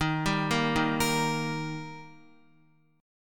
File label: Eb Major Eb down Guitar 4E,4A,5D,5G,5C,6F; arpeggiated; JGuitar